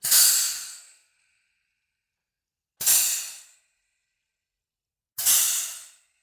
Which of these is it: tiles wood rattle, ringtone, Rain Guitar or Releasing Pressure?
Releasing Pressure